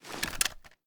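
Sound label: reload empty start twist, shotgun; reloads; iw8; romeo870; gmod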